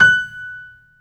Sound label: F#5 STR PIA, G.PIANO; AKAI S-Series CD-ROM Sound Library VOL-7